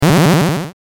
powerdown; sfxr

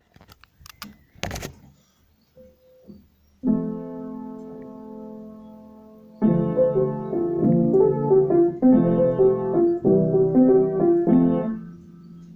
piano practice

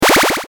powerup; sfxr

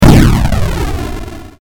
attack, explosion; sfxr

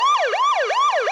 car move; criminal case game; map

